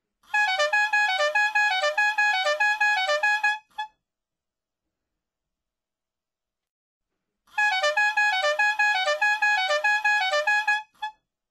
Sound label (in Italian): Clacson; il sorpasso